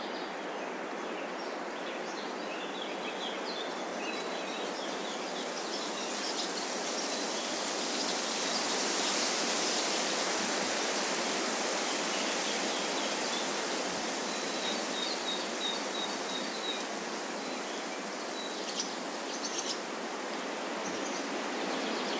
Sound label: nature; cstrike